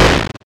GAU8 End Low; Weapons; DCS

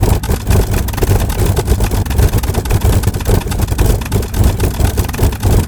Aircrafts Engines Packard Merlin Prop; DCS

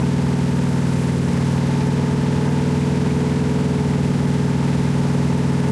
engines; World of Tanks

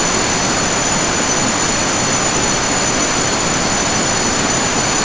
Aircrafts Engines Engine GE; DCS

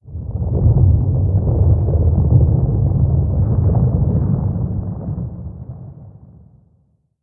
earthquake rumble; sub earthquake rumble; Modern Warfare

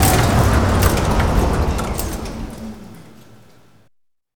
escalator stop

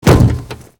bodyfall metal; bodyfalls

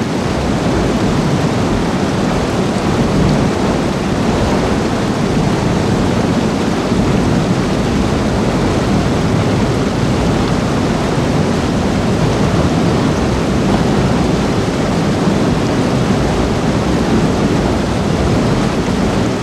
submarines engines; World Of Warships

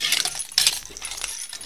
Inf Weapons Foley 3rd; Red Orchestra 41-45

